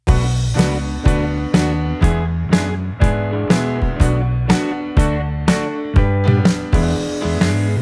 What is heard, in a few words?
Bass After, compression